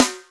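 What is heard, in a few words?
piccolo snare, Boss DR660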